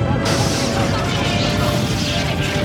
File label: Bridge In Battle Sounds; Trek